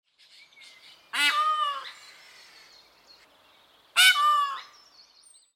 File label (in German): Weisser Pfau Pavo cristatus; Vogelstimmen